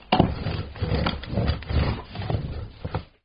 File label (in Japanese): 何かをこする, 生活音; 生活音系効果音